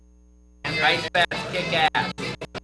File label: kick